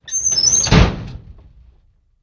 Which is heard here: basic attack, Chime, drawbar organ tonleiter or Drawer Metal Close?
Drawer Metal Close